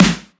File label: warm sounding snare one shot f sharp key